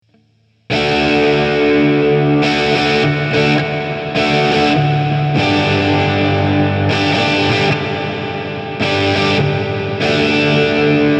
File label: TC8210 Deep Space